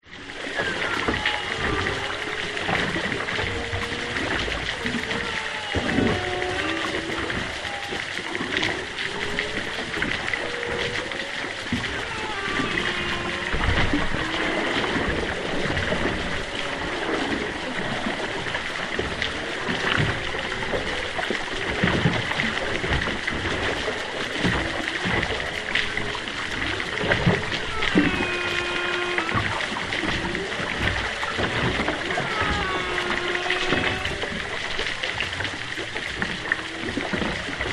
whales humpback underwater distant east australia choppy water; zapsplat